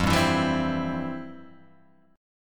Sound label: F Major 7th Suspended 2nd, F, down, Guitar, Open G